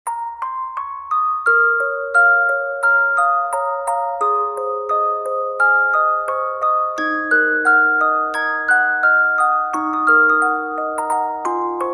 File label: music box